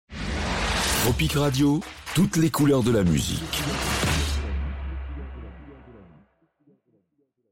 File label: Radio ID; Tropik Radio